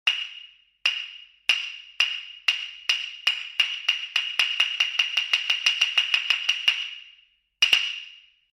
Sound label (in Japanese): 火の用心！雅楽の拍子木